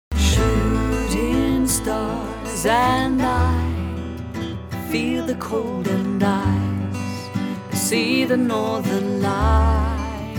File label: blues